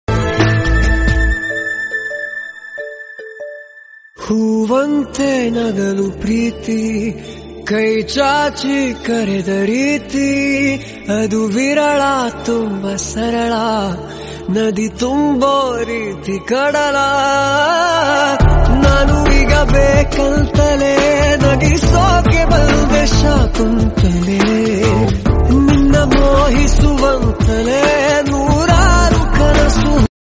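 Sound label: kannada song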